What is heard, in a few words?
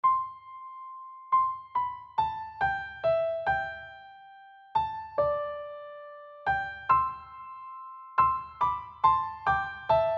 Piano; D&D Music